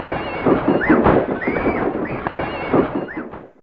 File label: Fight, Fairlight CMI IIe